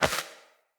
Sparrow Impact; Animals; Death State

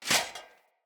Foley Dual Dagger Taunt; Raider; Enemy; Sinecure; Banneret

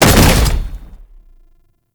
Wall Climb Impact; Warframe; Oraxia

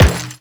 Step Metal, Footsteps; Warframe; The New War; Characters; Kahl

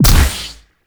Pistol Proj Explosion; Operators; Drifter

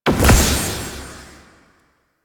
Corruption Pickup Large; Warframe; Missions; Zariman

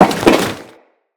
Crewman Land Default, Footsteps; Enemies; Corpus

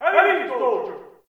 Ambient Campsite Yells; Warframe; Tau

